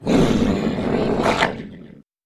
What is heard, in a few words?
dog monster growl